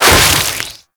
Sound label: Fast Whoosh, Weapons; Infested; Coda Pathocyst